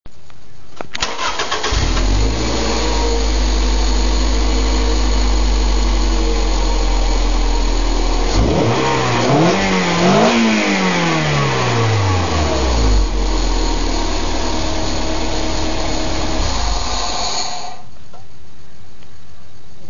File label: remus dual, exhaust